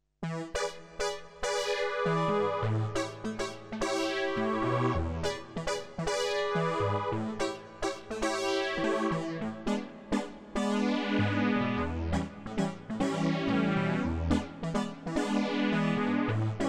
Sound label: Funky Brass